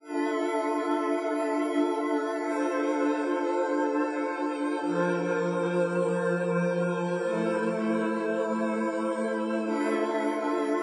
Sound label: Dark; I Am Ball